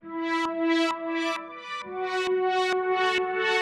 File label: 132bpm Lines n Loops Synths, uk garage samples; Solipsa